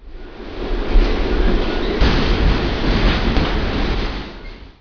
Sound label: subway; spawngirlsounds